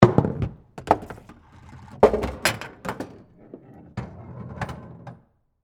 Ski Ball Throw, Technology, Arcade, Ski, Ball, Throw, Ball, Sequence, Swirl, In, Hole; BlastWave